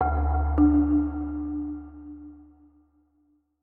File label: marimba